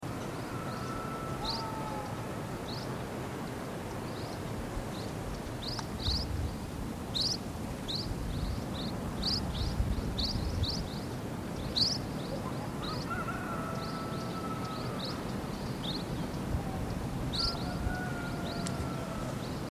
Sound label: black capped swallow